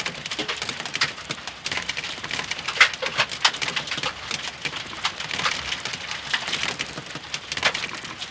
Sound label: skid little stones; Blind Drive